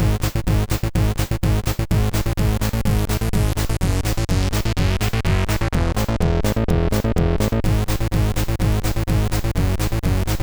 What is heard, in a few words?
Techno, sid MOS6581R3; Techno-Kaare